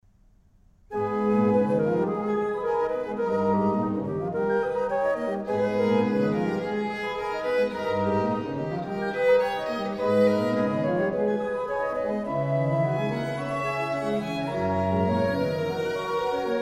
Concerto in C major for violin, traverso and organ, Largo; Organ Concertos